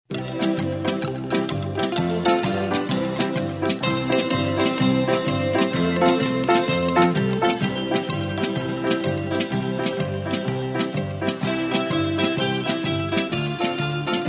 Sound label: harmony